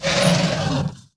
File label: attack